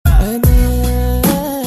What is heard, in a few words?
arabic remix